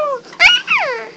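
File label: happy baby; CMI Sound Library Rev 1.3; HUMANS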